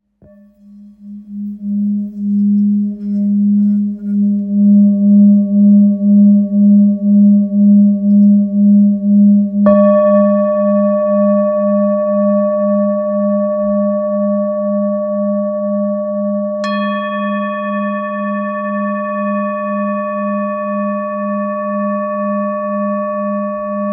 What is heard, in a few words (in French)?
Bol full moon sol diese